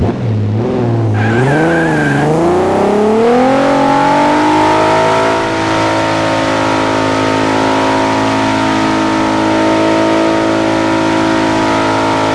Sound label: rev; mas ghibli; vehicles; tdmcars